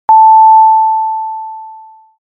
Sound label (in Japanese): 時報サイン（最後のピーン）